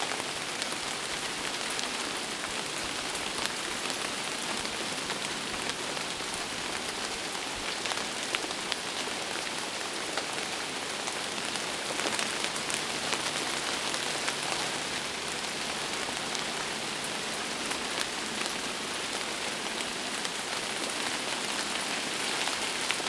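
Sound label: ambience Rain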